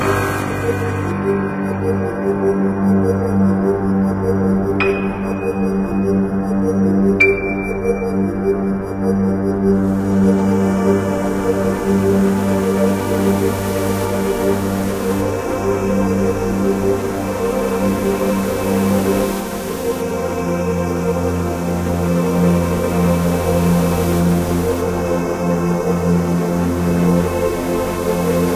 Dark Cave Loop; Jolt Super Robot Racer; ps4 switch wii u windows gamerip 2016; Future World

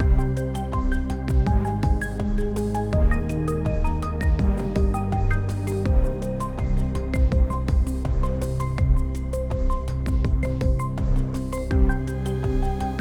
Vive Exploration 8CH 82BPM

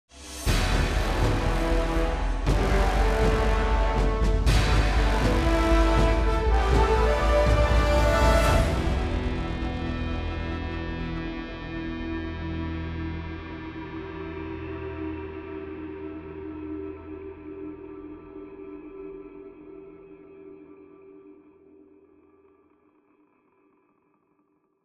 Win; Apex Legends Season 12; Character - Gibraltar - Win

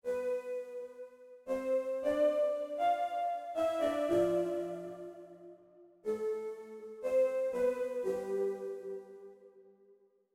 Stingers Cycles Dream

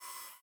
hud photo focus; teardown; ps5 windows xbox series xs

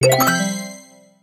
Success; the princess and the frog; Diner; Light Buford

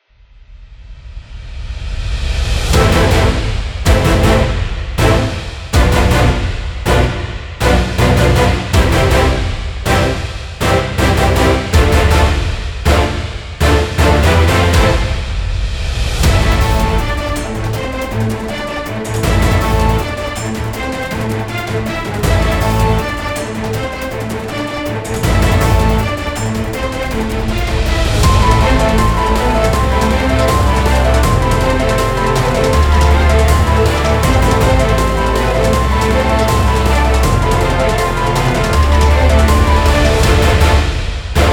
BGM PVE

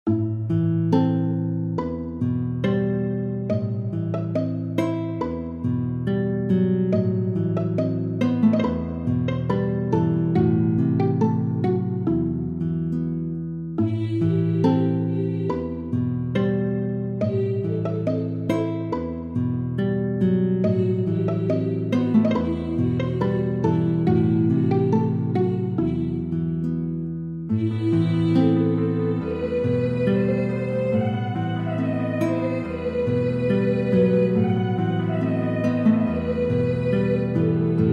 Intense Rising; Gem Hunter

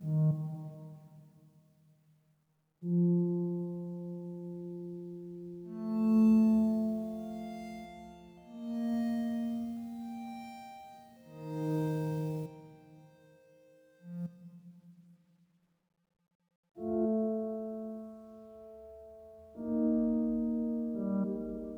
Slow

